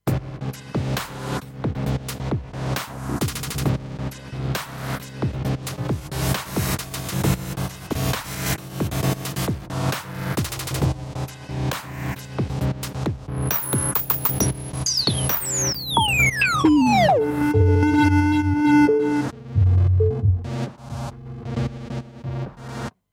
Trap n Bass

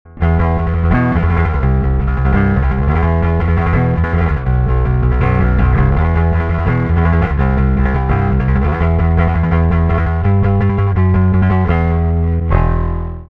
lo mix allboost bassheavy; Omega

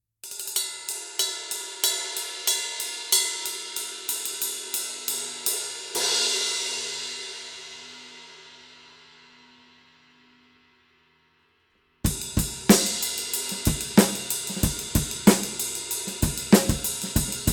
Classics Custom Dark Ride

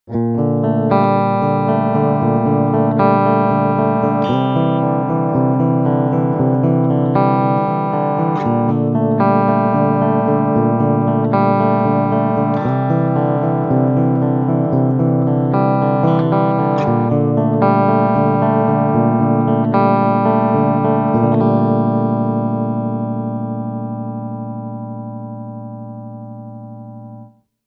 Humbucker Steg clean aktiv

